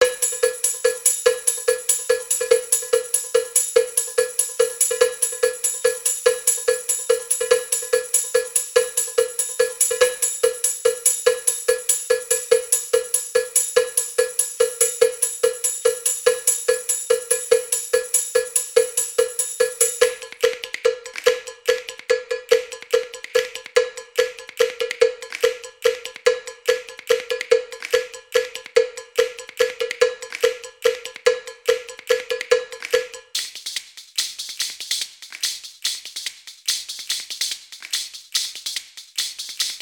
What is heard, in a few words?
Amb Sea Clock; The Adventures of Tintin: The Game; The Secret of the Unicorn; Xbox 360